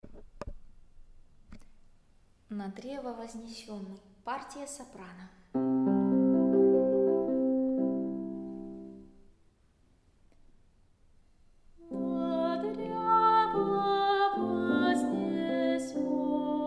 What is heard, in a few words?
soprano; Na drevo voznesennyj